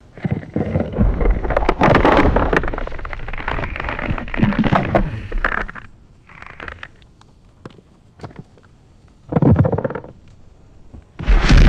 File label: massive ice creaking and cracking